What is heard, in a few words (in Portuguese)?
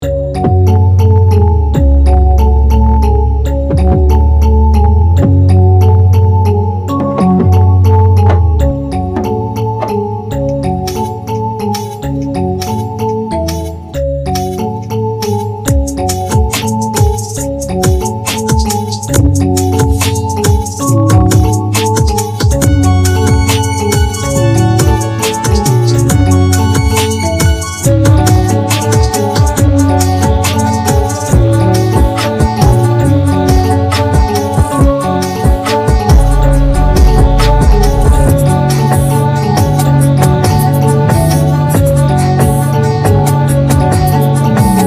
beat